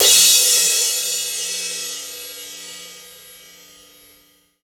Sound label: Crash, Drum Hits